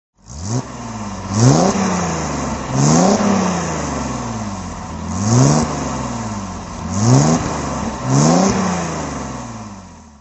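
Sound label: 220se throttle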